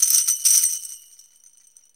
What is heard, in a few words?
tamb rattle; DRUMS & PERC